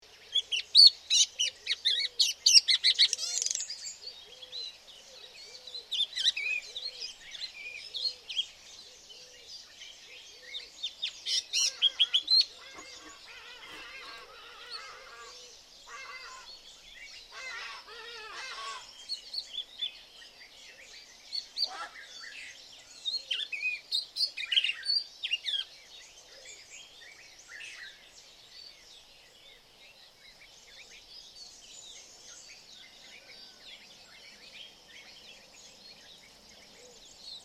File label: angola swallow